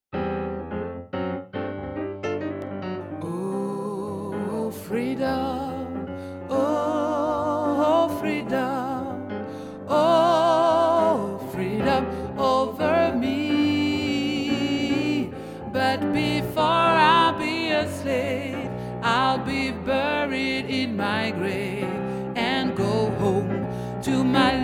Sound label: Oh Freedom Altos, Gospel